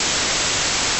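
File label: noise, LPF with a cut-off frequency of 3 kHz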